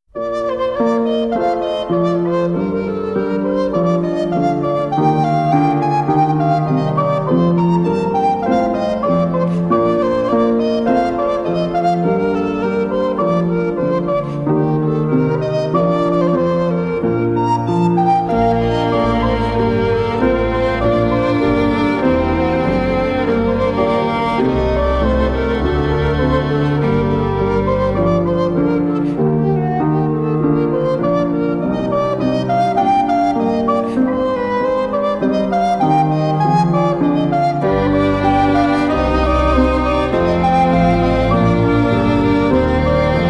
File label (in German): choralvorspiel